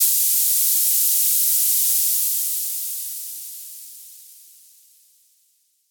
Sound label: Noise sweep 127